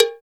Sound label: SOLID cowbell; COWBELLS